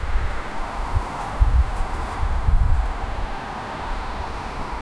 street, car honking

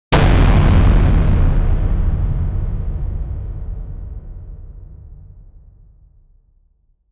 object manipulation

